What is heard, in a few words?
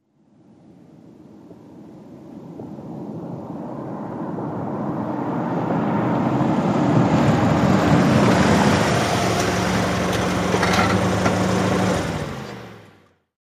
Sedan Apprch Off